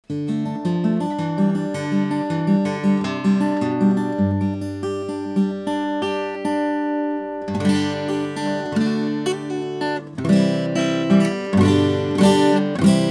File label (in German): westerngitarrenaufnahme klangbeispiel